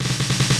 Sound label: Drums; Uro Man; windows